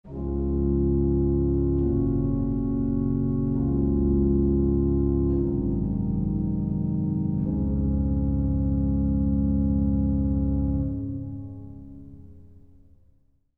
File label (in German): vergleich floeten